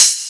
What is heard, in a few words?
Open Hihat, Cymbals; Vengeance Samples